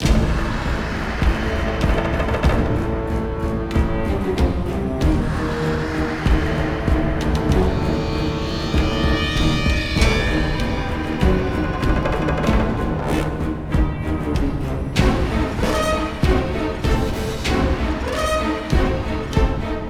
Boss combat; Bosscombat Ch06 01

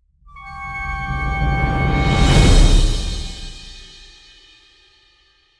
combat end

